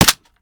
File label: fire last mech; weapons; gmod; viper